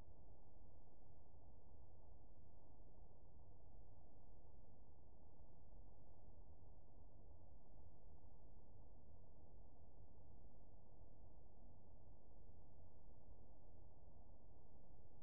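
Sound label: hydrophone; ICLISTEN AF2534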